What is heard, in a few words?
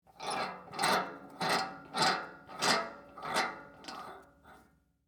Spigot Close, Industry, Spigot, Close, Turn, Handle, Metal, Tank, Water, Heater; Laundry; BlastWave